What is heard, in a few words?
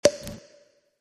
confetti blob